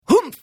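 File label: Hit, Khajiit female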